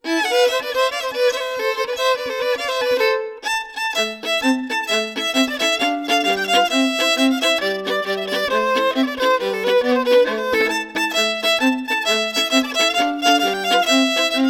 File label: Tarantella napolitana violon; Mon Violon